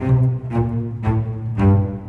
Bass Cello

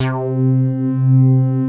BASS C3; Best Service Dream Experience; R100M BASS

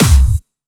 Distortion Kicks, Bassdrums

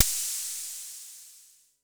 FX; MPC DSM-2

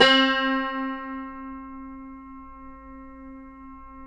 CUMBUS C, Guitars

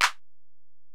EPerc, Alesis DM-PRO

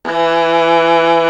SOLO VIOLA; East West; Global Sounds

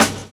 DJ FILL

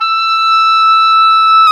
oboe, Roland Orchestral Winds; Roland LCDP04 Orchestral Winds; Oboe Brth 1

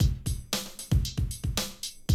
Classic Drum Loops

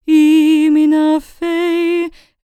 GAELIC, World Voices, GAELIC FEM

